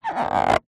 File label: balloon popped skin rubber stretch squeak